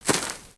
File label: Footstep; Lego; Strombie; creatures organic